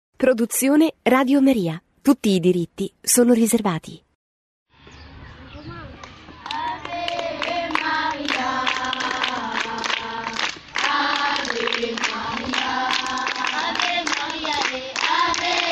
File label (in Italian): BAMBINI CONGOLESI